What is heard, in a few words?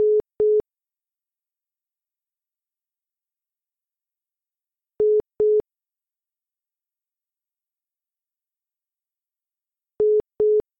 Au Call Waiting, tones